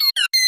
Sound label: Droid; Contraption